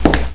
WALK; Sirens of the Pleasure Dome

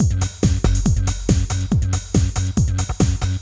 mr-beat

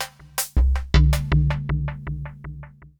PERCUSSN AMBNT 160, Ambient, Drum

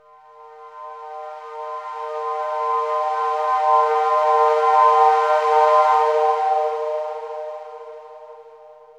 SYNTHPAD AMBNT 160 C; eJay; Ambient; Sphere

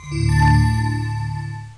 Anvil; Fantasm 5 Demo

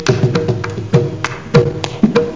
rhythm4 (tabla); Octamed 6; walkabout; indian perc

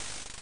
Door Open; Pushover

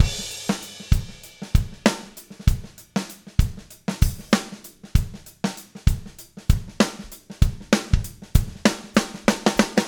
jazz; maudio; RB Disc

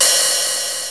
Open Hi-Hat (Bb1); SoundMusicSys; Falcon Music

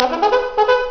Cube trumpet; backgammon

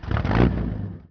Rnd Noise; The War Engine

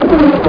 turrt, sentry, weapons; Quake; PainKeep

